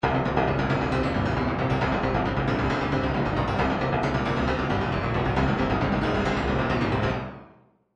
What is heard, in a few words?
trance midi loops; newtronic trance constuction kit